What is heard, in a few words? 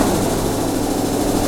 Servo Large LOOP